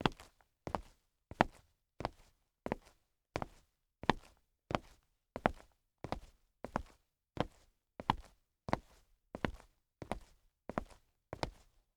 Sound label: Female Boots Walk; LaundryCinematic